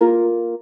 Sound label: Harp Bb Eb Bb